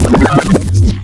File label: TAPE STOP, SHORTFX; Big Beat Samples & More; AKAI; SHORTFX KIT